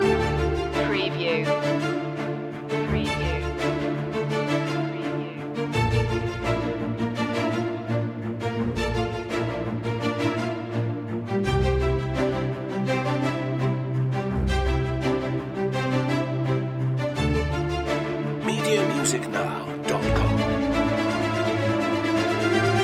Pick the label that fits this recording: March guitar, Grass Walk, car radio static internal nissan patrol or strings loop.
strings loop